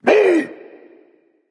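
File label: non verbal fear, voice; cernunnos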